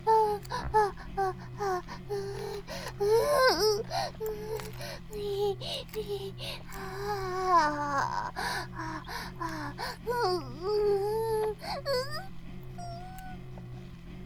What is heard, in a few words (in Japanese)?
バイブ放置; イクたびにわけて